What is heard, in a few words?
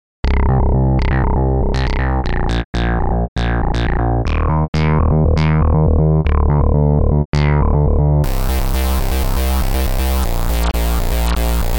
bass fast